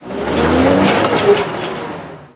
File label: Snow cat